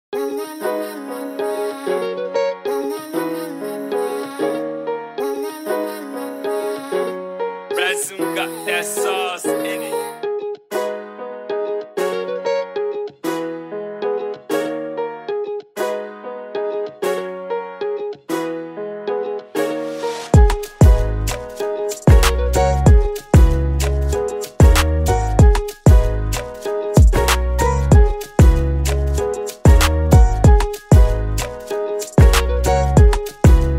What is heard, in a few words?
Afrobeat Type Beat